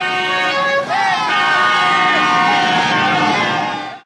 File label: honking and acclaiming